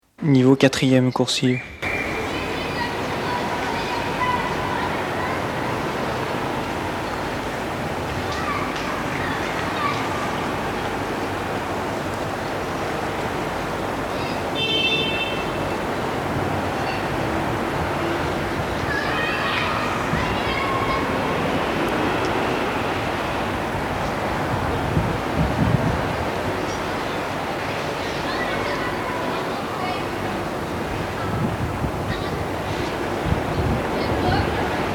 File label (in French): proxemie acoustique habitat perception 4eme coursive